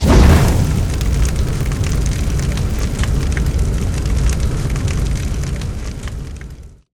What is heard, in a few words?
Fire Flame Burn; Silent Hill Downpour